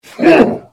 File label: Hit; The Elder Scrolls III - Morrowind